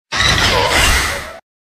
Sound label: Cry